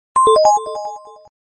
short electronica message alert tone ringtone; sms ringtones

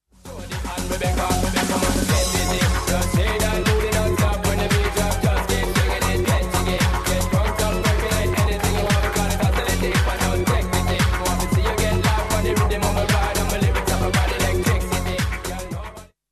club mix song ringtone

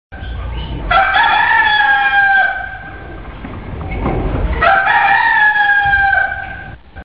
rooster crowing ringtone

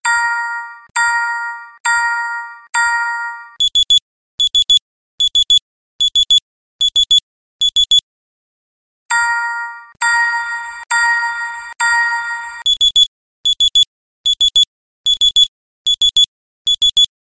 alarm beep S7 ringtone; samsung

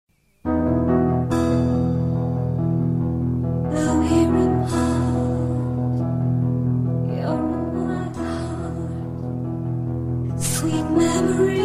light romantic song tone ringtone

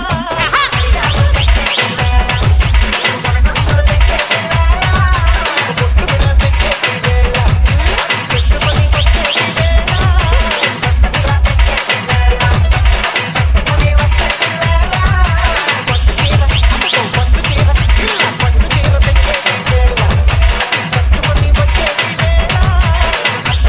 telugu dj music ringtone

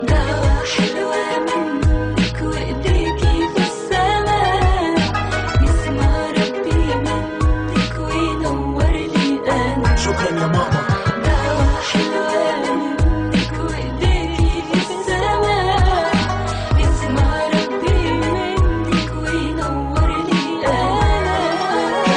arabic ringtone

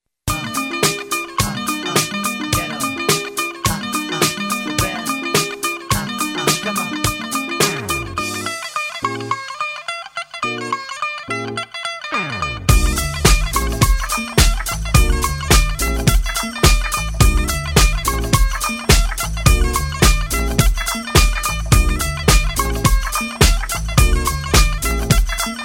punjabi music ringtone

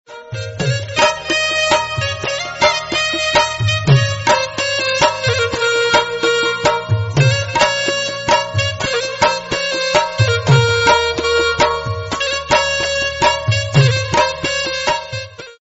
pashto soft music ringtone; for iphone; bollywood

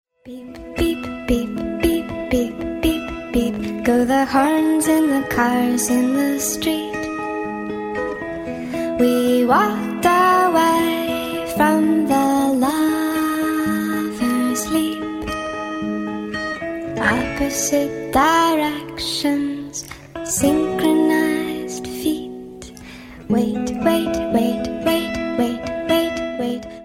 beep beep song ringtone

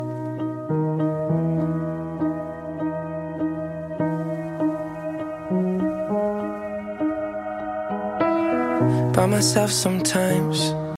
english ringtone

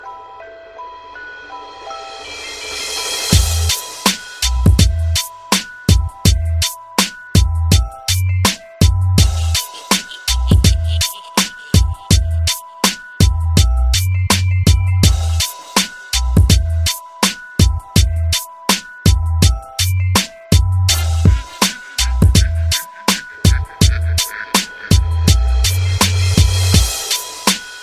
classic hit ringtone

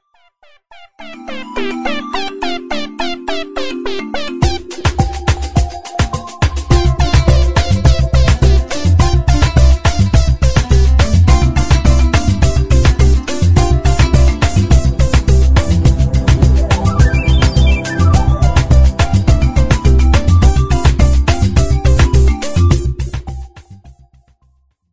note8 samsung ringtone

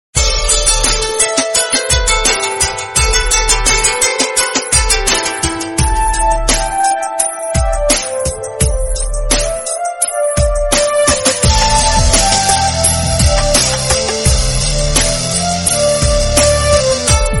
best original mobile phone tone ringtone; samsung ringtones

